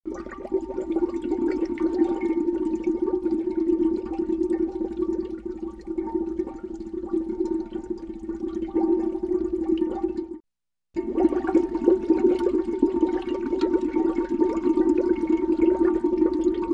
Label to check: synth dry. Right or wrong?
Wrong